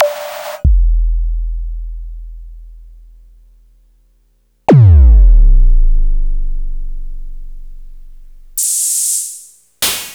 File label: Clavia Nord-Modular Another Kit